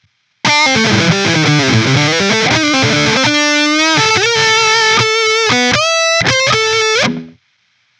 Guit Solo B6, guitar

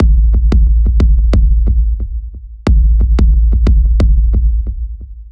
Machine Beats, электро джаз

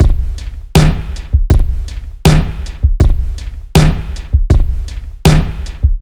Vintage

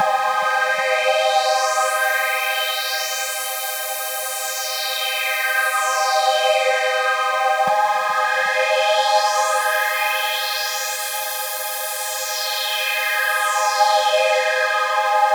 Wide Spread, dance electro

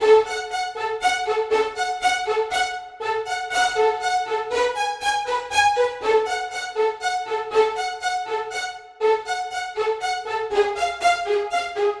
violin; playful waltz

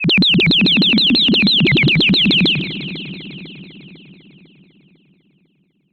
Sinus Noise, electro dub

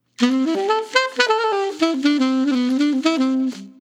Chorus A, jazz

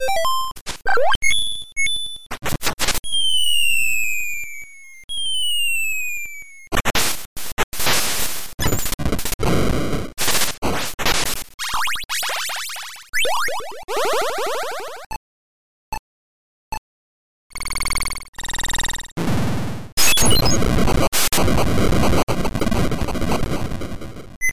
all sound effects; castlevania

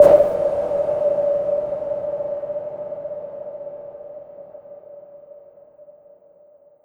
Big Hall, техно транс

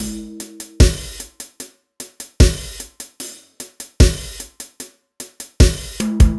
Jazzy; сэмплы ударных; регги

